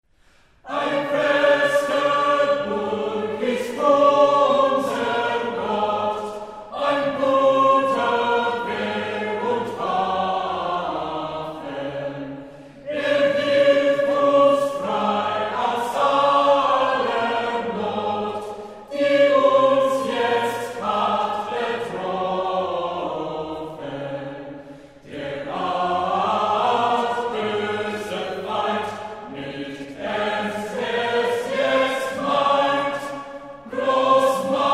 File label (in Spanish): Coral; Martin Lutero; Ein feste Burg; Renacimiento